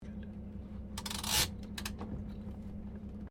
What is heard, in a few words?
CAR HOLDEN FE 1957 INTERIOR HANDBREAK ON; Classic Australian cars; Vehicles